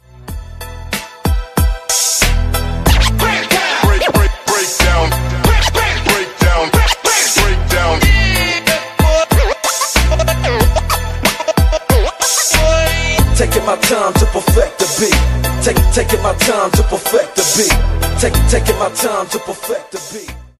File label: Ringtone Mobile